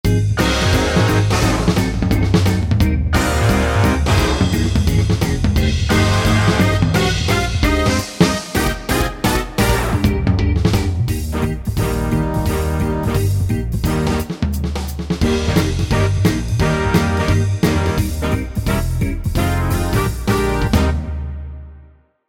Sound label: big band count